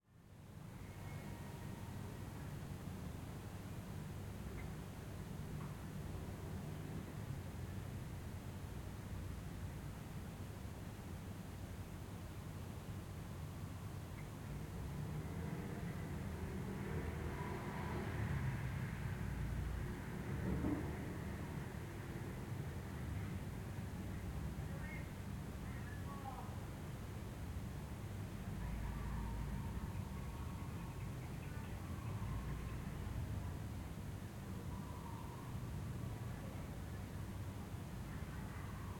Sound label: THAILAND, BANGKOK, ROOMTONE SLEEPING ROOM CONDOMINUM DAYTIME, WINDOW CLOSED, QUIET TRAFFIC; AND BIRDS FROM OUTSIDE; creativeaudiopool; AUTHENTIC THAILAND SFX-VOL 4